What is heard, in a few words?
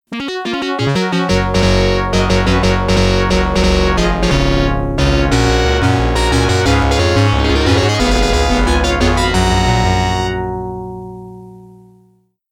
Stage Clear BGM; Gun Smoke; Capcom Music Collection Vol.1